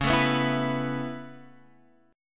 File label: C sharp Minor 7th Sharp 5th C sharp Guitar Open F; C#m7#5; strummed; JGuitar